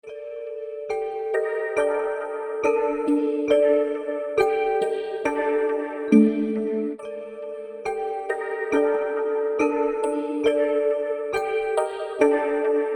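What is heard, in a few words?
D minor 138 BPM Pad Synth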